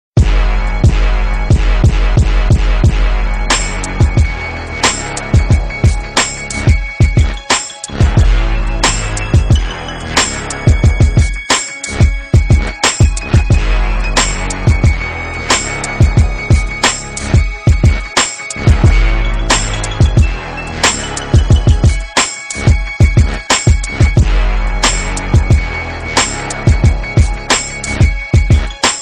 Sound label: Cool Beat